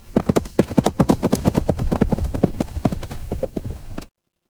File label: animal horse gallop